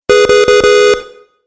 Car Horn Quick Honks; vehicle

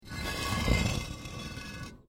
Brick Medium Hollow Move; Material components for constructing more complex sounds; Rock Sound Effects

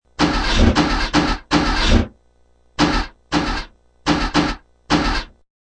Car Not Turning Over, A car failing to start; Cars; Vehicles

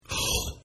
Gasp; Gasping; Sound Effects:Voice; Partners In Rhyme

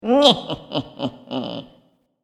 Witch Cackle, Evil Laugh; horror sound effect; Andrew Fleming; Twistedtracks

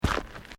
Footsteps Boots Gravel Fine Step; Footstep Foley; Human

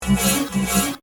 Synth, Button, Beep; Science, Fiction; Communications; BlastWave

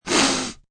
Sniffle, male, single, cold, sick, (human voice); Sniffling

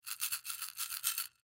Baby Rattle Shake Shaker Beads; Foley Baby Rattle Toy Shake Shaker Beads; Miscellaneous Toy; Blastwave

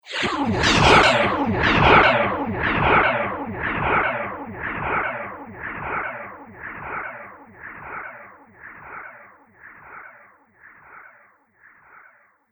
Space Crash Impact With Delay; Sci Fi Sound Effects

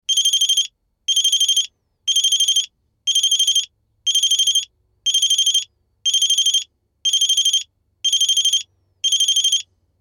Mobile Phone Rings Various; Telephones; Electronics; Tom Hutchings

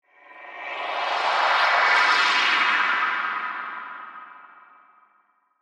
Swell Ethereal, Swell, Ghostly, Whispers; Ghosts; BlastWave

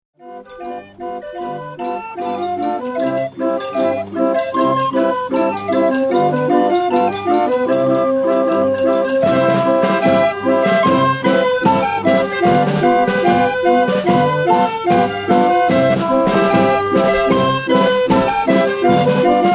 Vintage mechanical musical fairground organ; Miscellaneous Musical

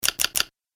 Multimedia Triple Button; Button Sound Effects; Andrew Fleming

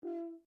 HORN E5 P SHORT STOPPED, French Horn in F; Alexander French Horn in F; Sound Effects:Musical; Foundation3

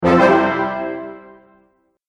Ta-Da Brass Fanfare; Cartoon Sound Design Sound Effects

